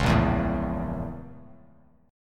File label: Bb Minor Major 7th Flat 5th, Bass Standard; BbmM7b5; strummed; JGuitar